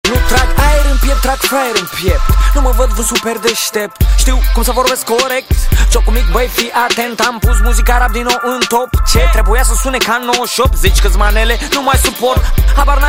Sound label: Hip Hop